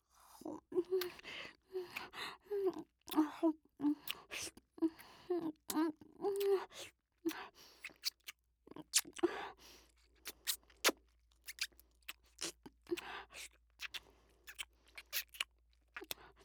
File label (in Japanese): ディープ乳首舐め; 僕とお姉さんの音声; ループ音声集